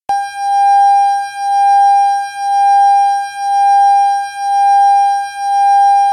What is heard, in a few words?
Beats